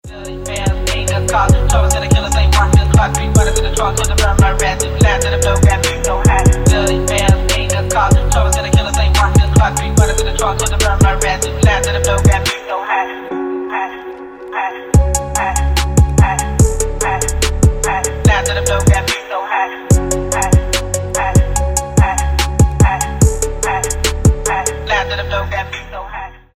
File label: phonk